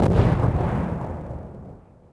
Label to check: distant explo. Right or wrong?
Right